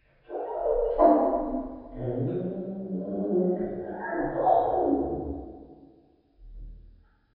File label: bird budgie song; dfcaverns; df ambience